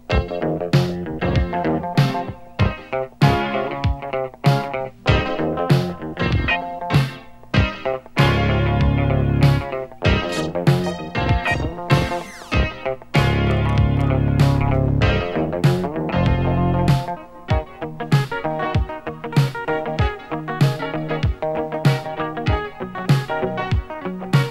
Main Line (Bass + Kick + Low Guitar + Some Horns and Keys)